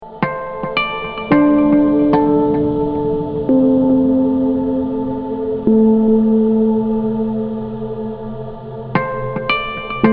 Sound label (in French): fleur cachée harmonic